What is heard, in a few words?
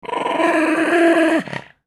mad dog